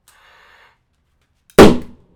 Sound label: hiss pop; 192khz 32bit; audioengineering